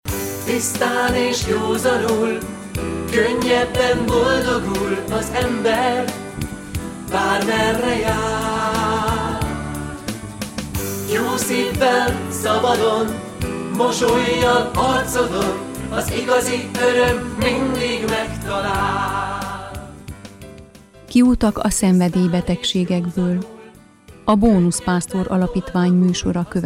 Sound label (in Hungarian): bevezeto jingle; Kiutak a szenvedelybetegsegekbol